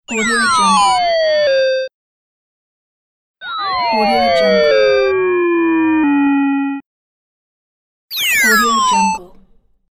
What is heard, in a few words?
transformation